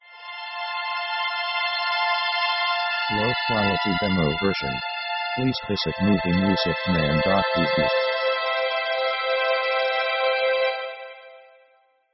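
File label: orchestral love theme sketch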